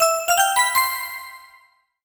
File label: Bell Voice Positive; startide